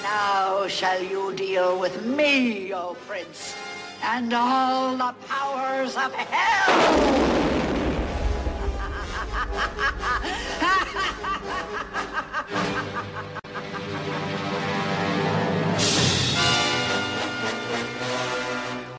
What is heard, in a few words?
ringtone